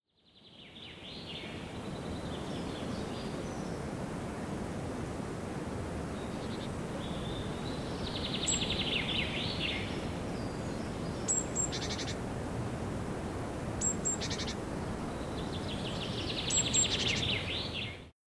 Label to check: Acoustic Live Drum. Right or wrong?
Wrong